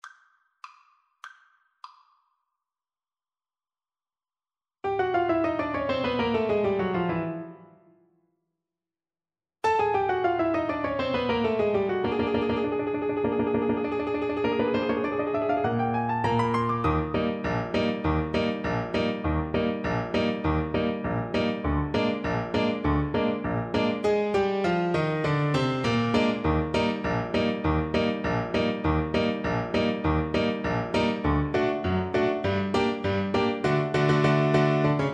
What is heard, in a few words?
Trumpet